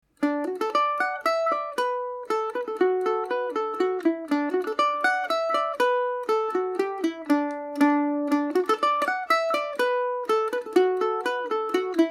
john cronins polka dmaj, mandolin

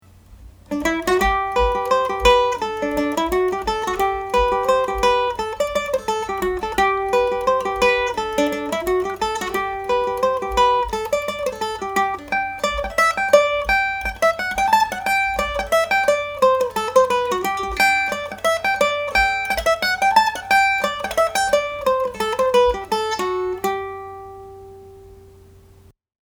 tommy peoples, Irish Mandolin

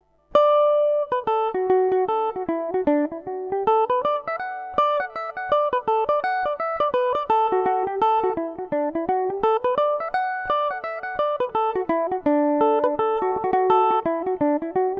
green mountain dmaj, mandolin; The Green Mountain; Revelation Jaguar electric mandolin

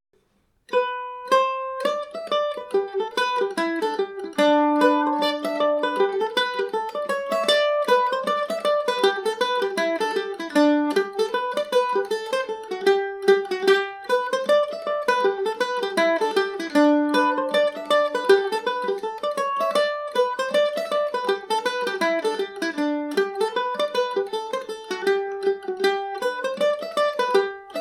hornpipe, mandolin; cocky

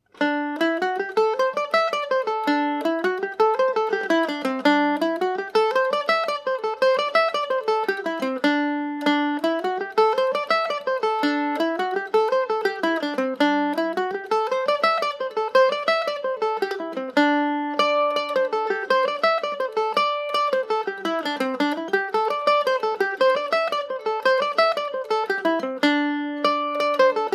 mares tail dmix go with pipe drone, mandolin; G&O #34 mandolin; The Mare's Tail

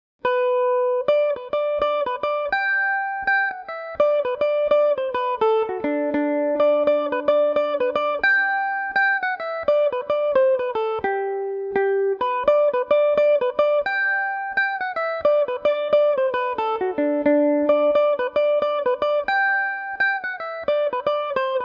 ivy house gmaj, mandolin

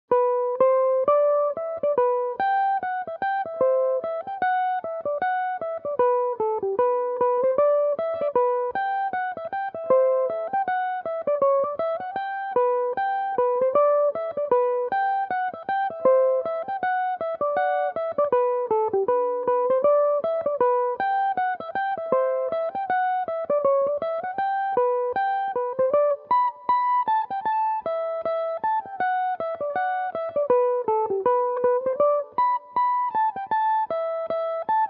barndance, Irish mandolin; Pearl O'Shaughnessy's Barndance Number 2

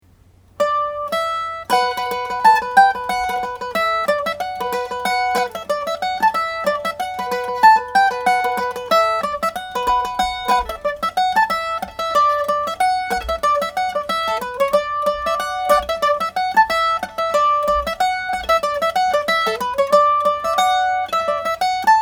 glass of beer, mandolin; Eastman MD304 mandolin

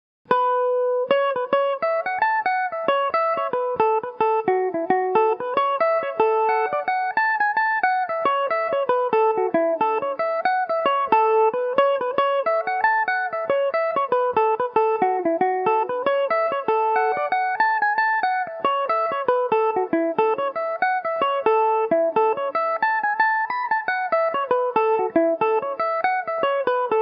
killeigh abbey amaj, mandolin

